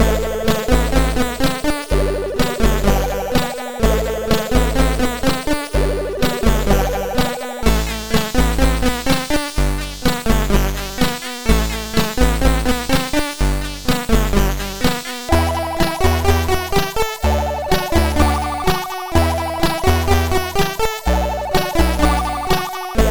sid, MOS6581R3; Triton

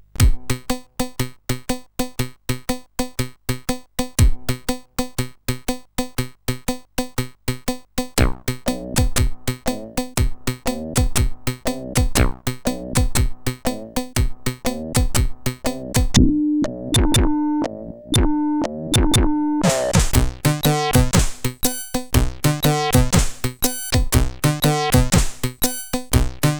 Reggae Me sid CSG8580

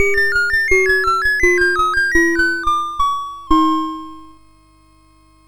sid MOS6581R4, GAMES; Chinese Juggler

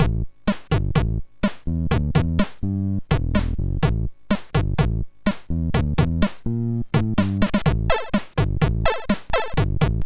Simple Loop, MOS6581R4

